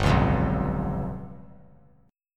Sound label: G 7th Flat 5th; strummed; JGuitar